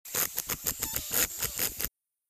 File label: Mechanism Chuffs, servos